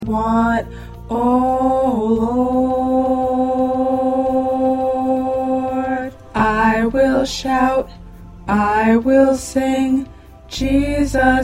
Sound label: Tenor, choir parts